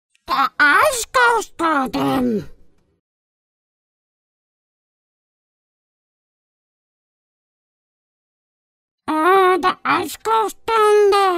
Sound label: kobolds outside cave